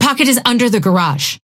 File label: ping synth; Wraith; under garage